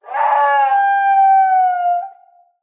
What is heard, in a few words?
Wolf Cub Trapped Call Far; Vocals; Animals